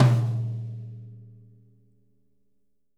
SNRONF R, Living Drums

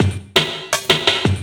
LOOP, 160 BPM, Drum & Bass

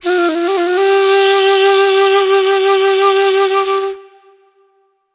Ney, Wind Instruments

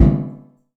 Kick In Room, TR-606 Bent Samples; Sonic Foundry (Sony Creative Software); The Electronic Music Manuscript Collection; Battery 3 Sample Kits

